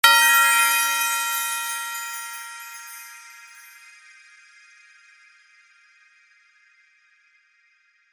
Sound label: Christmas bell hit jingle bells ringing magical